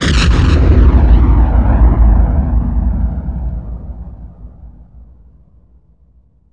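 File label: combat explode yt1300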